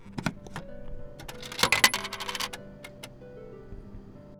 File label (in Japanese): 自動販売機 返却レバーとコイン返却